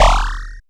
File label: Synth Perc; Dream Zone